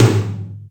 Night L, Acoustic Tom, Yamaha DTXpress

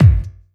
bass drum, Drum & Bass, 170 BPM; Ueberschall; Drum & Bass Resonance

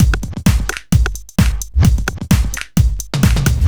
Skip, Drum Loops 130bpm; Zero-G; Soundclash Nu Dance Electro; Acidized Wavs; Nip Tuck

